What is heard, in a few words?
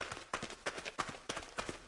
footsteps gang; Be Cool Scooby-Doo! - It's Dark Out There; ThrillGames